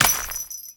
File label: Step Dirt, Footsteps; Warframe; Grineer; Royal Jester; Foley